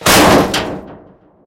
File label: metal crash; STALKER; IWP